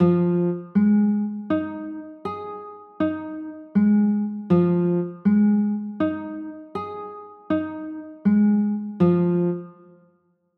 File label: f m7, chords